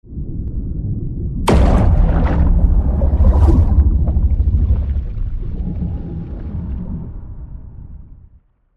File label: Naval underwater missile launch shot; ship